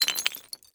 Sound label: ceramic shards; porcelain shards drop